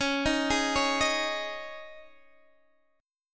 C sharp Suspended 2nd C sharp Guitar Open D, chord